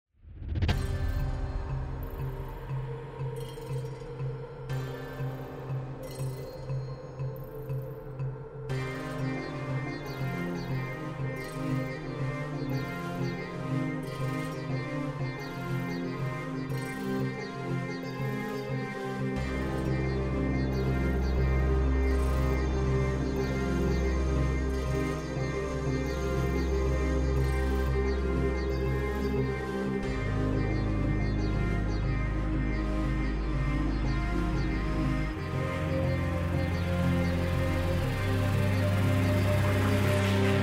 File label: song